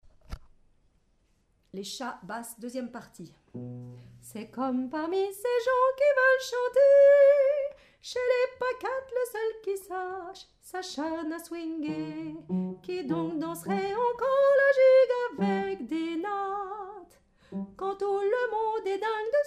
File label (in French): Basse